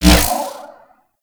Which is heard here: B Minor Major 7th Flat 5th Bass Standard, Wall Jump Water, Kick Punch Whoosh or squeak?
Kick Punch Whoosh